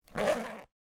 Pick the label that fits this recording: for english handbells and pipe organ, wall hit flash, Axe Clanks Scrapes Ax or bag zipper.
bag zipper